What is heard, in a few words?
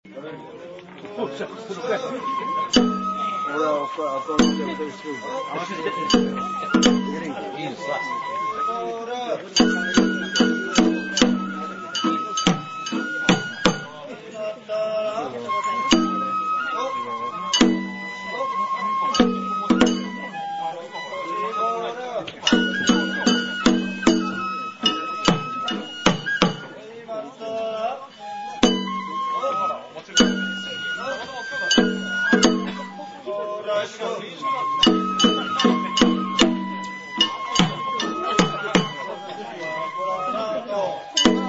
banjo